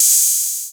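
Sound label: 808 open hi hat; Wizoo - Platinum 24 Electronic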